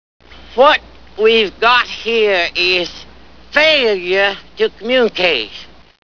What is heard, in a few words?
failure